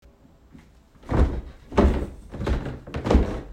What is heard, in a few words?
Walking Down Stairs